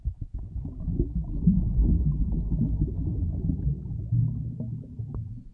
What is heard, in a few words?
bubbles; environment; Tribes2